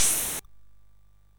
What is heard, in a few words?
Shuffle, MOS6581R3; hvsc